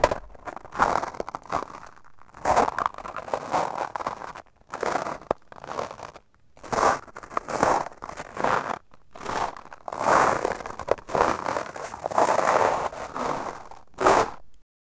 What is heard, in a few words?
crunch of studded tires